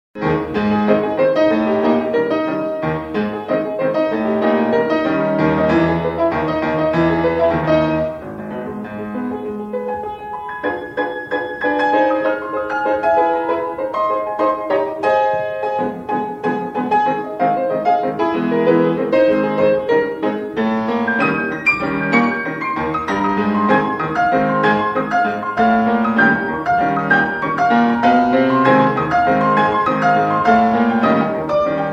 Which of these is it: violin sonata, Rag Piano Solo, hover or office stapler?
Rag Piano Solo